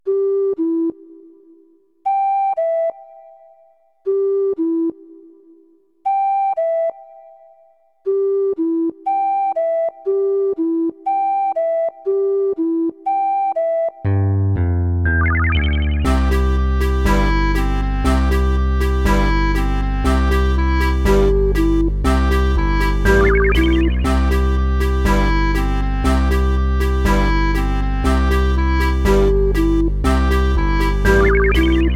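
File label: Cuckoo accomp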